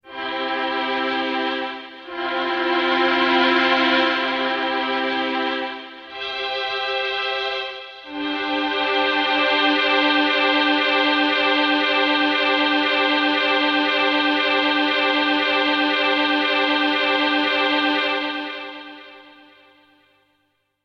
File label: Warp